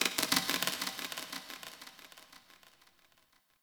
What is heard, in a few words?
Metal Knocks Texture Small, Corpus Ship ambience